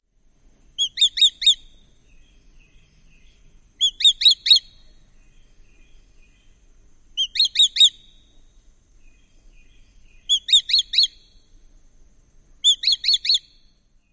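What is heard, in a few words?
kli-kli-kli alarm calls given while perched, Swallow-tailed Kite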